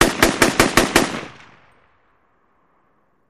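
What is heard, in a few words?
Machine Gun Round Fire; bursts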